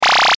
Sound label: Sci Fi Stun Gun Scanner Device; Battle; Blastwave